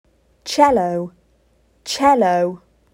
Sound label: Cello